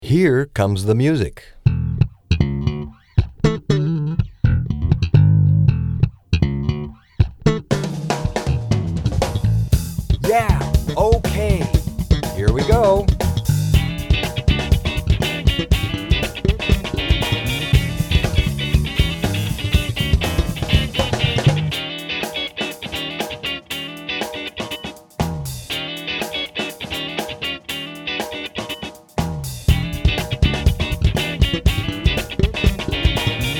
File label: fp-4 fp-7 audio key